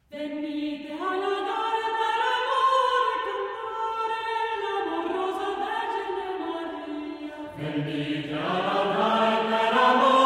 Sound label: Vocal Ensemble; Laudario di Cortona; Excerpts Arr. P. Hillier; No. 1, Venite a Laudare